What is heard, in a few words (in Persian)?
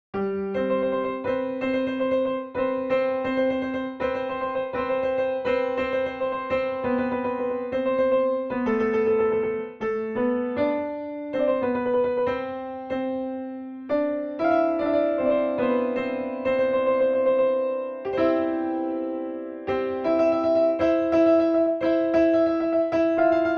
Kereshmeh, piano; Mahur; Radif Mahjoubi